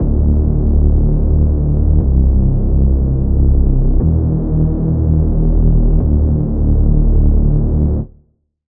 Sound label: reese, Sylenth1; Reese - Moral Ambiguity; Routed Sound; Sylenth1 Expansion - Street Vanquisher Vol.1